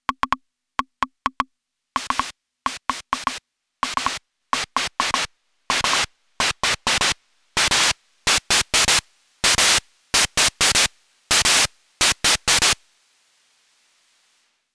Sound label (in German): Akai Rhythm Wolf Percussion mit Noisefloor